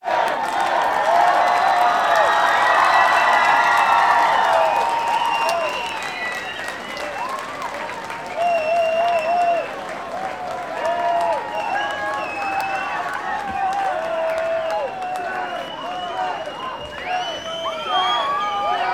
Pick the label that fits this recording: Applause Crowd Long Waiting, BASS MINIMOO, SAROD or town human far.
Applause Crowd Long Waiting